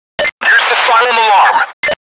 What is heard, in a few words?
Silent Alarm (text message tone); Bain; Payday - The Heist